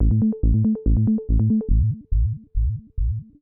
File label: SNTH BASS TEKNO 140 A